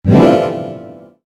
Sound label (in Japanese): キャンセル音